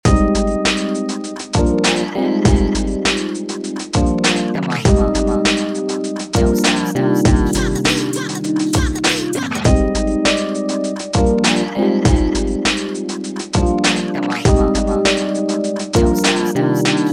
game over, music

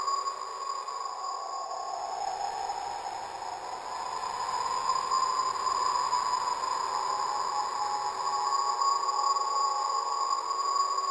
scary, music

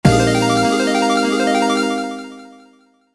game Over End pixel

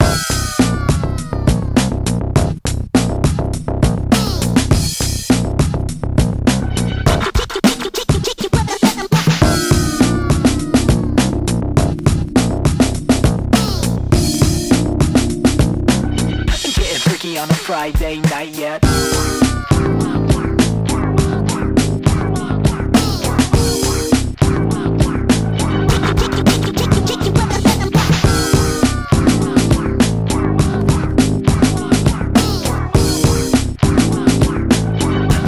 freaky menu, music

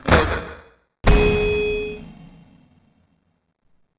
loss sfx pixel; fnf

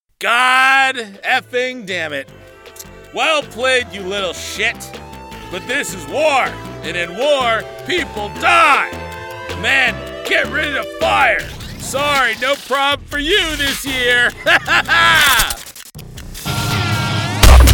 stress cutscene

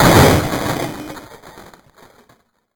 ANGRY TEXT BOX

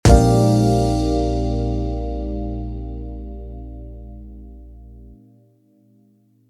game Over End

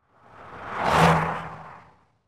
car Pass

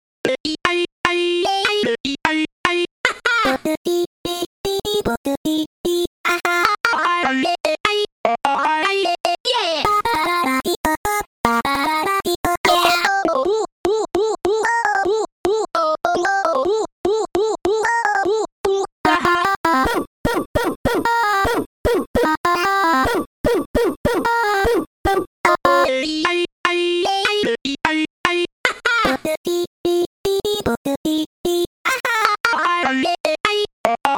voices

